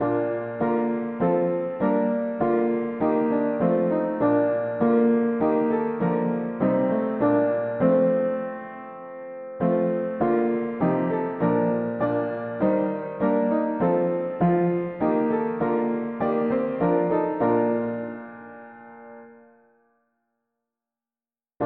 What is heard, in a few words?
mono piano, hymns; Come let us join our cheerful songs